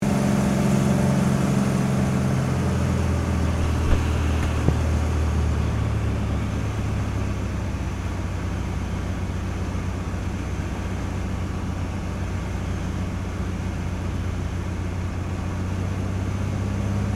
AIRCRAFT CT4A APPROACH LAND INTERIOR, military trainer aircraft; Airplanes; Vehicles